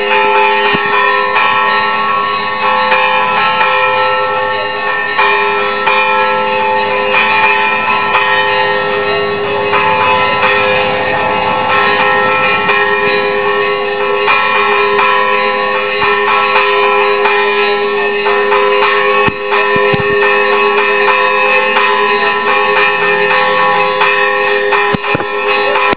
concert cloches, bresil

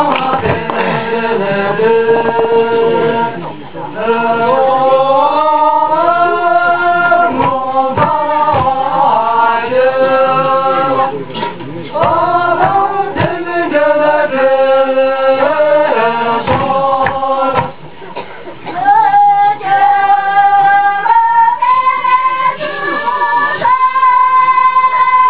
chants, nepal

